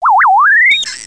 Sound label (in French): GAR slip; MOBICLIC; GARE